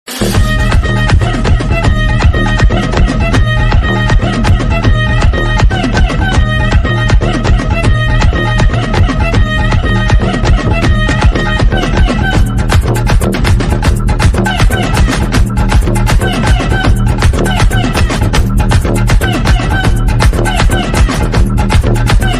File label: climax fight bgm ringtone tamil bgm ringtone; maaveeran